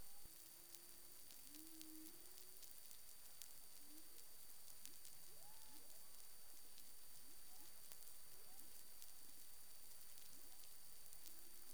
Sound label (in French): CLIC DAUPHIN